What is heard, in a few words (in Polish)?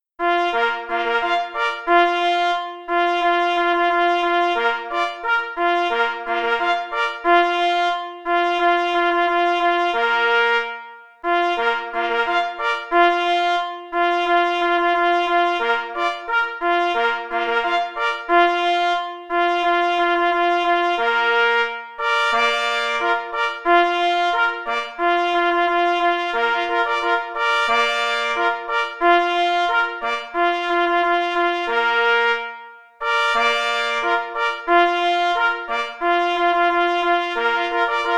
Marsz; J. Rosner; Marsz pszczynski nr 3